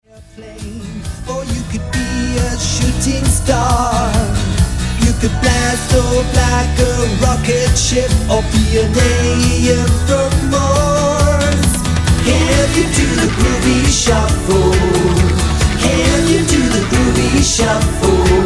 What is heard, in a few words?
Groovy Shuffle